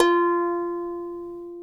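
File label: harp F3, Elizabethan harp; InVision Interactive; Lightware VOL-1; Instruments & Percussions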